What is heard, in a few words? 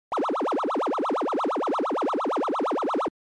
Arcade Shooter LFO